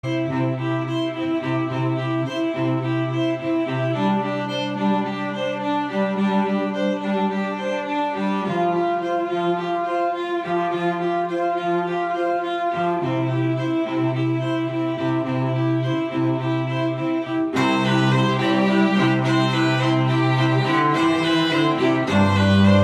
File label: Yellow String Quartet